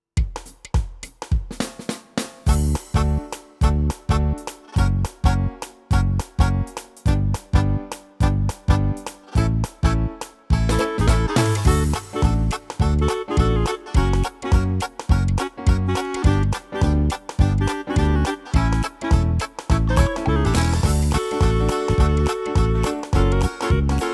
style swing and jazz charleston, yamaha tyros3

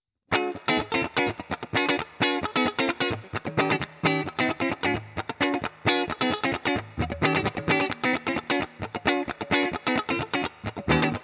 clean rock middle; léger grain sympathique pour le funk; indie totalnatural; humbucker bien gras

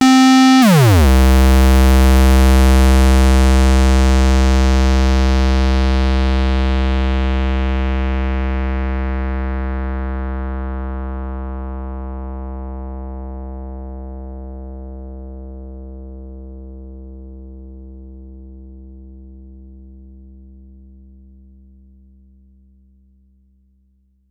real moog glide square; MiniMoog; gmedia minimonsta; ohmforce

